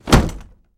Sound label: Body Falls On Crate